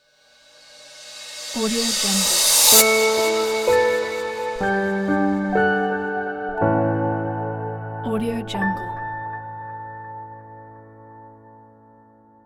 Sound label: logo reveal